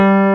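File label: Wurli electric piano; Pro-Rec - Synthsonic; TRINITY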